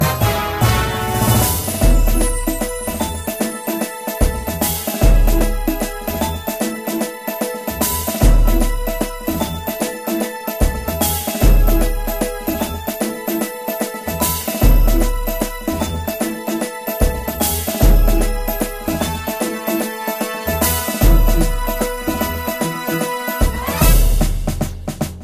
Game Result Clear; Dr. Mario World; Android

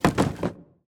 Loot Chest Shake; Android; Portal Quest

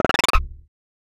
Unused Jingle; Super Double Yakuman 2; SNES

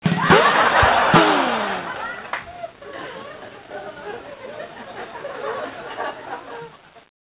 Jingle Joke; Neighbours from Hell; NDS

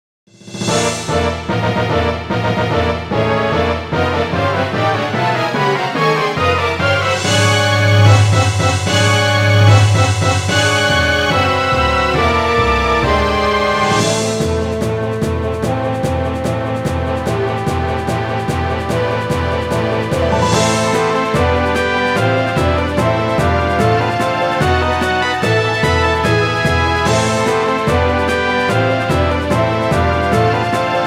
music quest boss

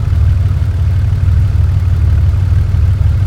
Porsche 911 Gt3 Rs Idle; car simulator 2

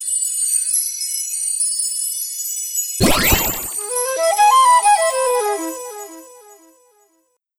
Snake Charmer Flute

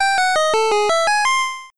Puzzle Solved Jingle; Hyrule Warriors Definitive Edition

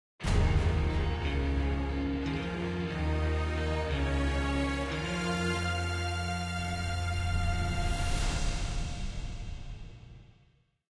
Stinger; Great Wall Third Gate

